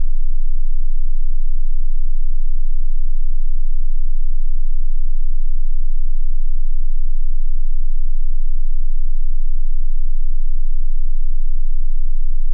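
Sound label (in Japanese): 16 Hz, 信号音; 1 オクターブ上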